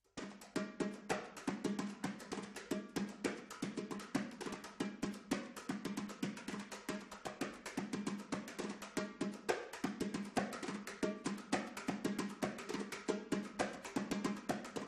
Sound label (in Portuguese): repique de mão